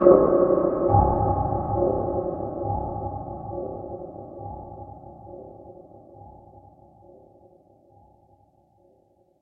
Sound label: Impact Cinematic muted Resonant Impact then Boom with Long Delay